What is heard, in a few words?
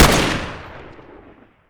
m14 fire, weapons; garrysmod